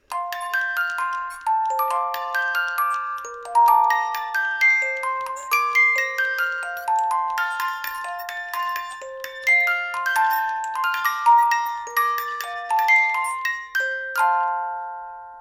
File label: jingle bells